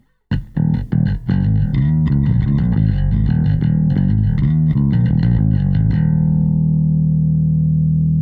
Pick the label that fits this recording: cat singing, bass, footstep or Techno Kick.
bass